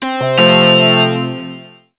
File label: Hospital Code Call